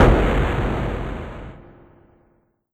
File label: Roland PN-D10 Pineapple Bomb